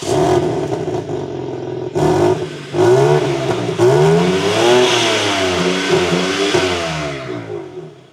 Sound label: m4 competition, bmw m4 competition m xdrive